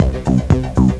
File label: bar, Music; Swoop 1.0.2